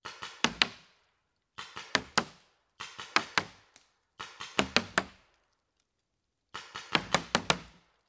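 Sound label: Paintball Gun Impact; Sound Ideas; Guns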